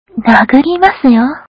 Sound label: rock